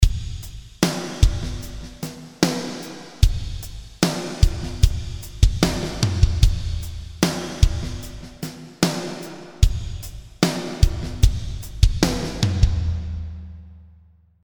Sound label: Drums Damping